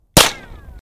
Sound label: 22 Caliber With Ricochet